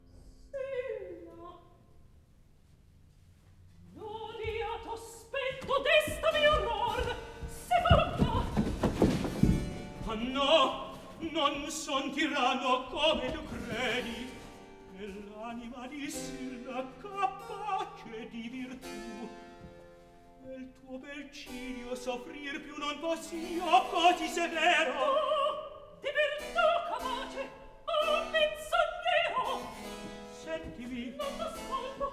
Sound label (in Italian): Atto Secondo Recitativo; Giunia, Silla; Mozart; Operas; KV 135 Lucio Silla - 1772